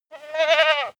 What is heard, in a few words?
goat baa short male